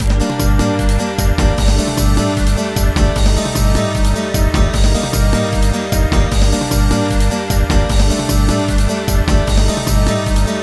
Polka Remix

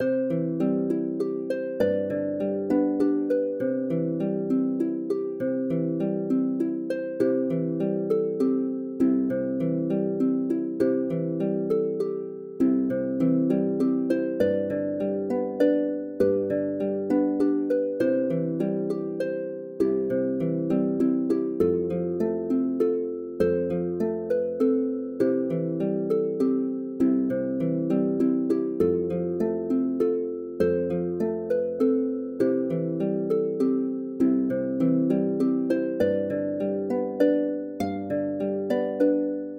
Harp Solo

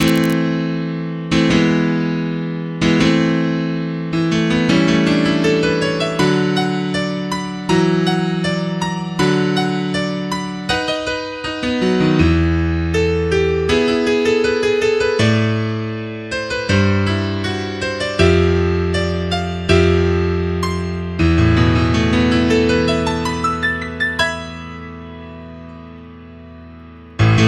Piano; O2 Jam

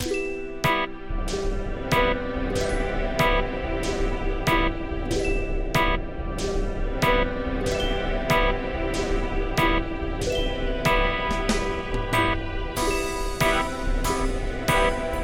Christmas Ballad

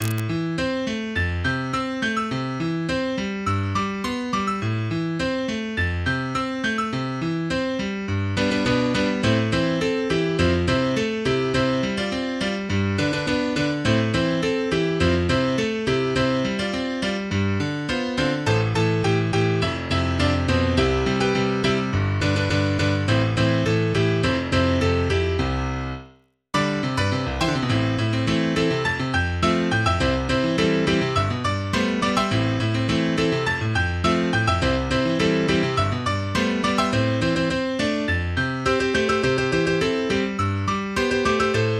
Whistle

